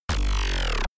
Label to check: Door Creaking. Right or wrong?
Wrong